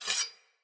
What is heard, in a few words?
Sword Unequip; Weapons; Player; Swords; Fenn Jotar Sword